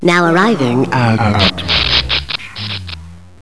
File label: broken tram